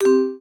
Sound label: Bet Decrement; Wu Xing; genesis